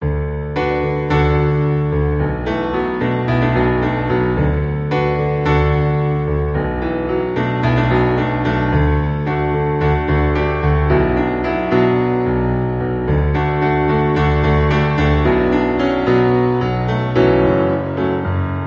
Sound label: Piano Cover